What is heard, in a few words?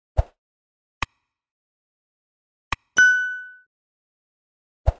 Subscribe bell